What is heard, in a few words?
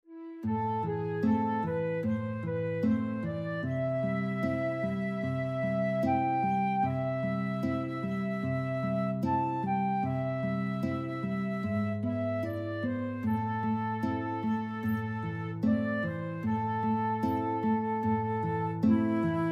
guitar flute duet